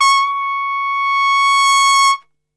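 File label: C#4 trumpet; Best Service; Brass Super Section; TRP SWL; 90 s Sample CDs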